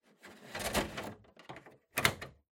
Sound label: Air Conditioner Filter Insert